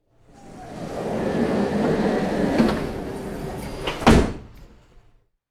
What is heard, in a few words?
interior train sliding door closing